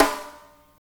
big room acoustic snare sample E key, snare sample tuned to the E note; snare drum samples